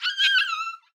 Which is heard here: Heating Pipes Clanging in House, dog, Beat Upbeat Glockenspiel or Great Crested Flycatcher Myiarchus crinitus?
dog